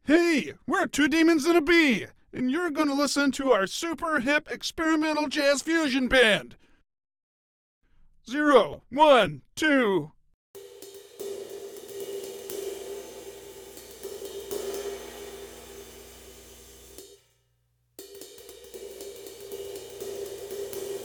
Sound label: Jazz Drums; Rick and Morty Virtual Rick-ality; ps4